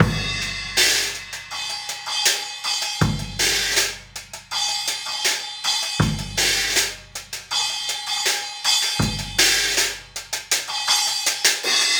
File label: Cymbal and Kick